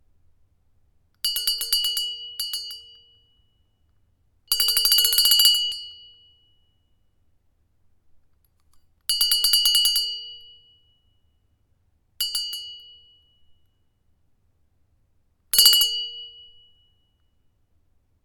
Copper Bell

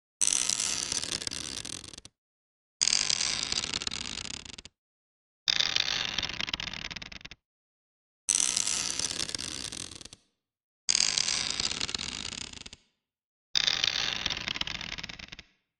Creaking

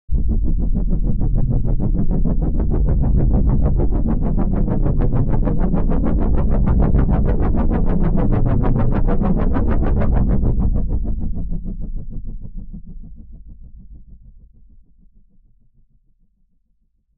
Reso copter medium Looped; Progic35

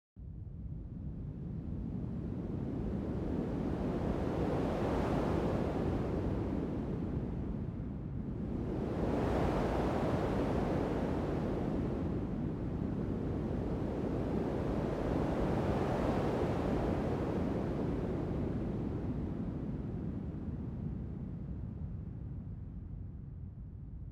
Sfx Waves Wind Swoop

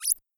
Retro Spare Or Eat Cute Creature

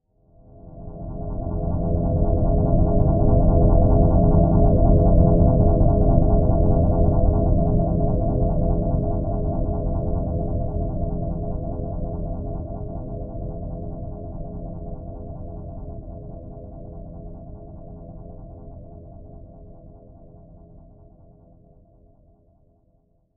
Lfoed C1

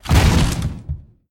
Wooden Door Breaks

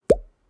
Pop

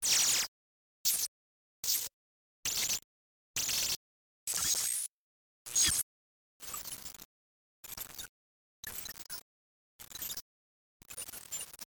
On Screen Glitch; Ui glitch

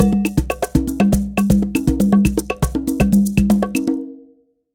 Latin Percussion Beats

